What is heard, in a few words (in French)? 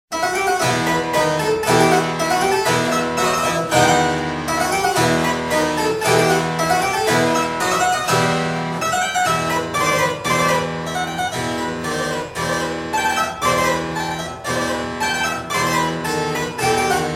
Tambourin Pieces pour clavecin; Jean-Philippe RAMEAU